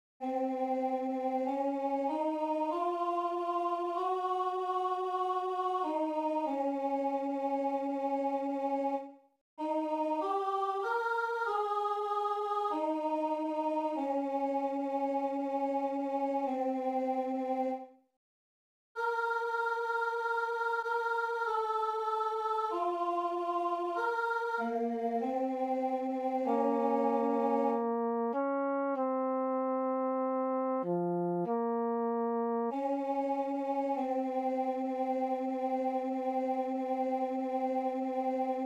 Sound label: stabat mater alto